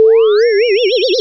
female pain

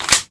smg clip insert

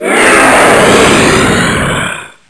rush

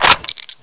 m60 box on

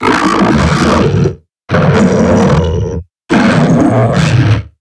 garg combat

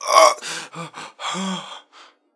low health; doctor

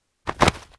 dead arm

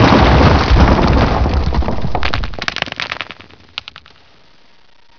rocks

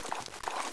m40a1 draw

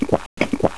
swallow

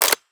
mp5 charger back, weapons

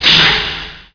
biglight on

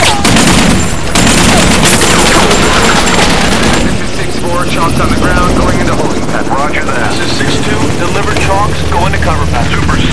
battle

